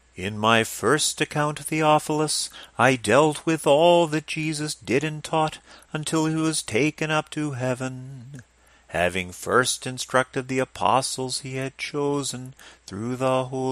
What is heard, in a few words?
Reading melody ornaments